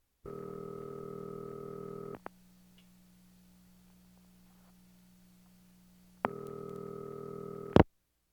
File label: Household Phones